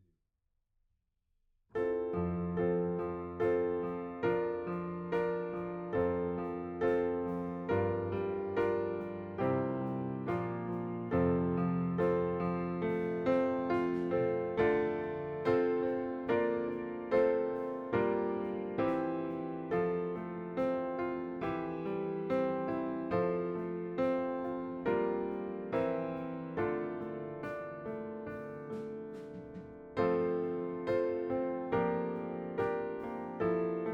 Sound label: Piano B